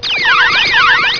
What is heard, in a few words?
out a tune